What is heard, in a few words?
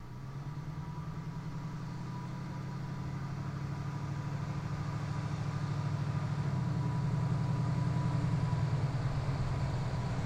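Blazer Drives Slow Idl, chevy blazer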